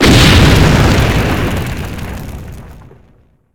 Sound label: fire rain; pirates of the atlantic windows gamerip 2006